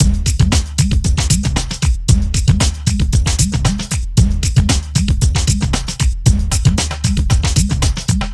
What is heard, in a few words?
115 BPM, Digital Breaks; Loopmasters; Jon Carter & Alex Blanco; Block Party Essentials